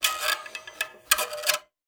Classical Guitar Extended Technique

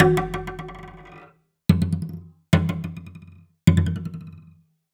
Bow Ricochets, Cello D Bass

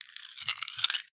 Marbles Rattle

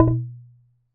Wooden Bar Bounce

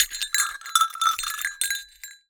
Cowbell Chain, Percussion

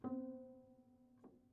Prepared Piano

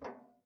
Ping, Kitchen Toaster

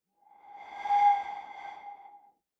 Bottle Blown

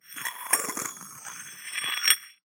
Hole Saw Scrape; Hole Saw Bits

